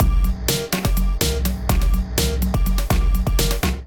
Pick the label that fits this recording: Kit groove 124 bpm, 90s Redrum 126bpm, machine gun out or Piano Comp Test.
Kit groove 124 bpm